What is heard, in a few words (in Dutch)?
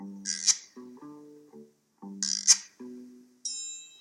Muziekfragment Oldies